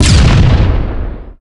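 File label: frag grenade explosion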